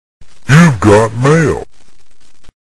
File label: mail